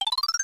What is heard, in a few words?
message box appears; smw2